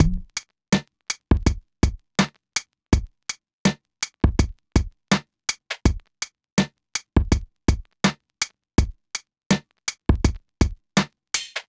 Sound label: Crappy Drum Loop 82